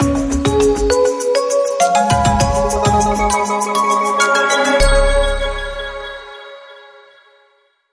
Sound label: Stinger